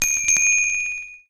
Chime, notifications; Samsung Galaxy Note 9

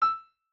rec start; Acer; neoTouch-S200

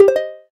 gayageum, keytone

